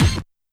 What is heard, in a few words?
Kick & Hit; YAMAHA RM1X